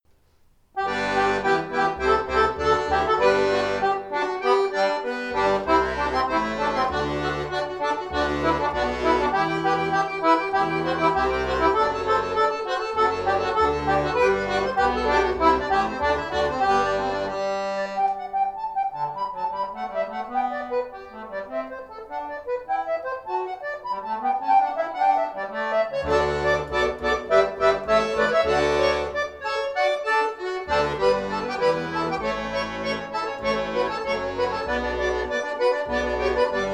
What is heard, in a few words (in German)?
Concerto G Dur; 1. Satz